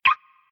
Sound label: stop scatter; Crazy Cars; wazdan